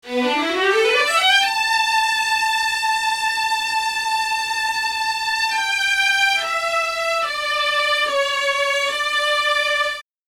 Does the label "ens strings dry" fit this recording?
Yes